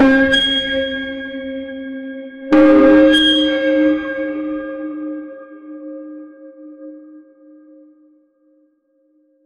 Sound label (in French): un son de bl, blunt